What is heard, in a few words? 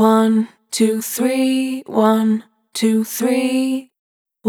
1-2-3, Dry, Multi-Voice Loops, Vocal Samples; Sonic Foundry (Sony Creative Software) - Pocket Diva World Class Vocal Samples